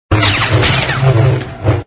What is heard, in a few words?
SFX sound (saber hit)